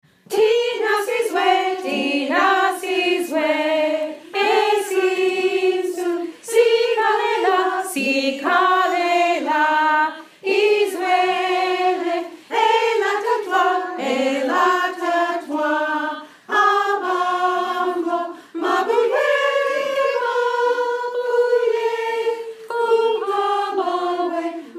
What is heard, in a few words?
Altos